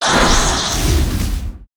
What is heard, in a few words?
attack; silpheed